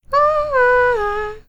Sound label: hum child